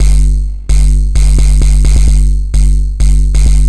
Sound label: Synth Bass